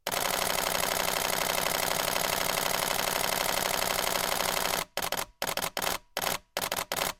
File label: Canon EOS 1D X Burst